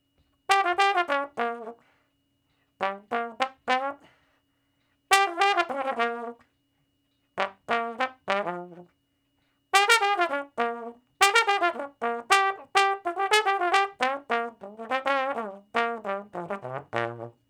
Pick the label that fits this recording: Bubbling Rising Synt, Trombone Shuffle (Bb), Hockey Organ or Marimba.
Trombone Shuffle (Bb)